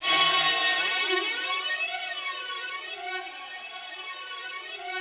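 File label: Rough Strings F#3, Yamaha VP-1; 90 s Sample CDs; Music Store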